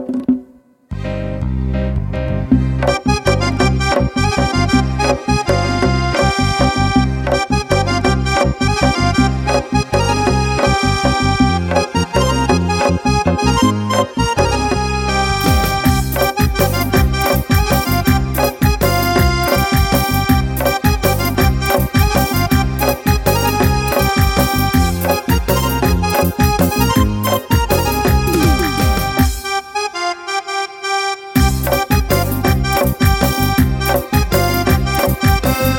Keyboard

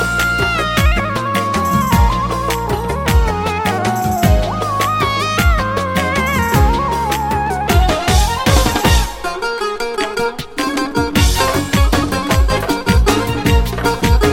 Violin

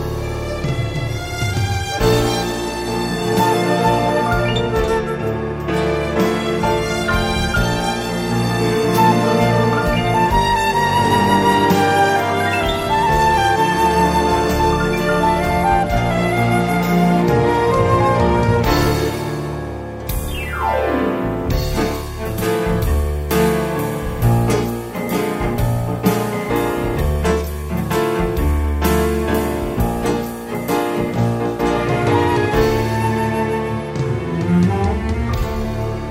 Violin

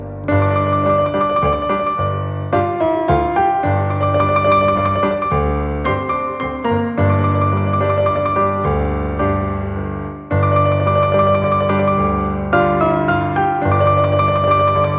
Piano

